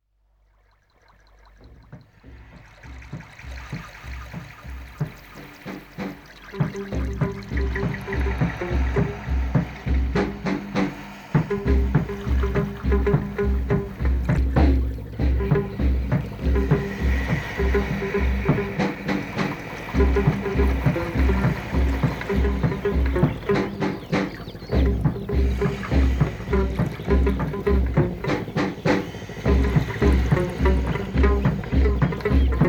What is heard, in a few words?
reggae